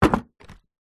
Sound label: foley wood box case small set down on concrete; zapsplat